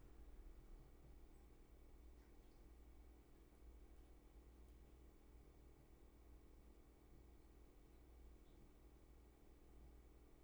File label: room tone; Stefani